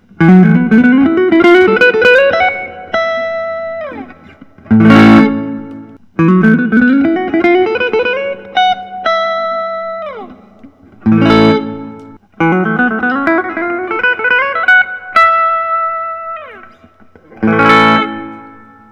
collings soco lc; iced tea sunburst

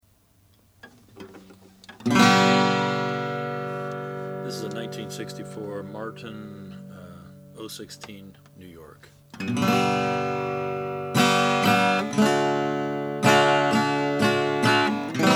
martin 0 16ny natural martin 0 16n

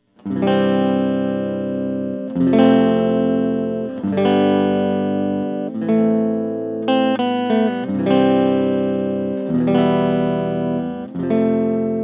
rickenbacker 360 fireglo sunburst

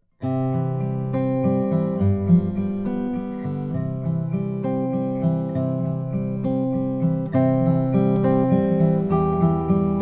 john kinnaird om cocobolo; westcoast cloud

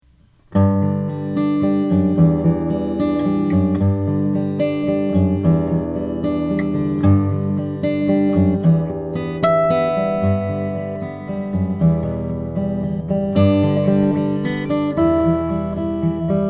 froggy bottom h12 custom brazilian

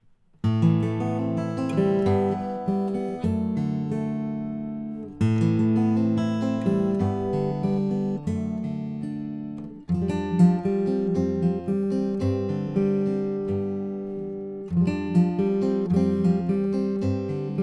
mcpherson camo

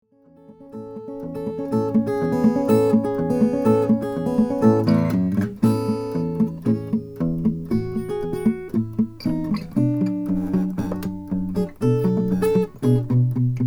tony vines 0 18 reproduction adirondack

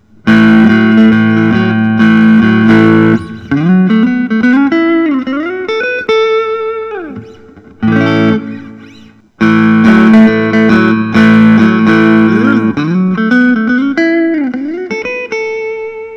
larrivee usa bakersfield t style; surf gree

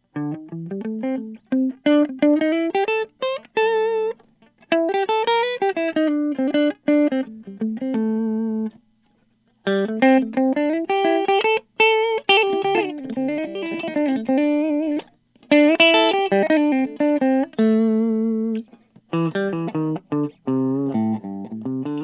gibson custom 68 black with blonde

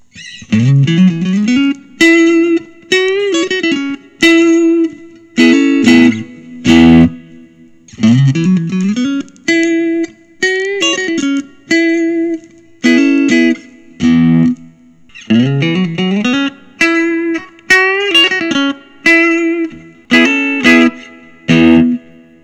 fender custom shop 51 telecaster nos

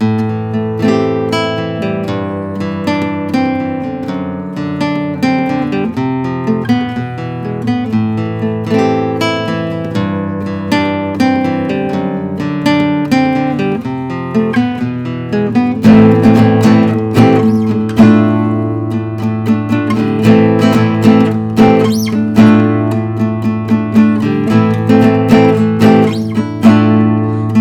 martin 0-16ny natural

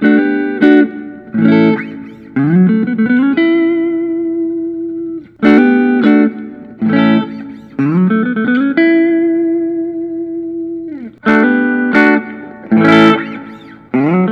prs private stock dgt copperhead

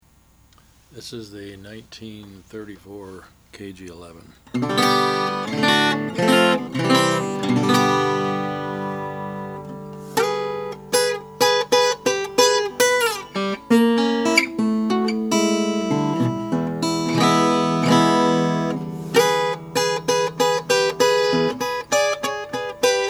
gibson kalamazoo kg11 sunburst; 1934

